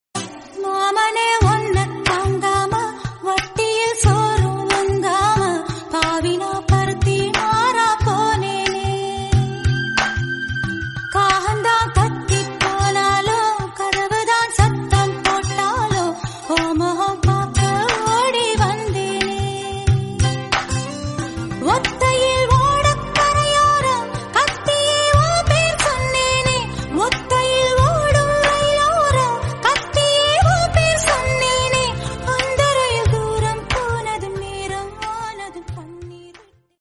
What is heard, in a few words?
Husband And Wife Tamil Song